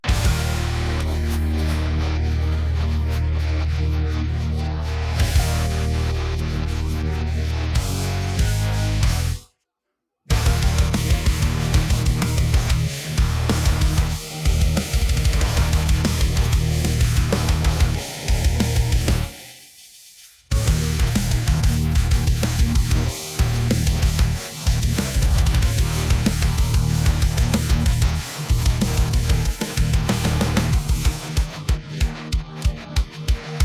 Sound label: Instrumental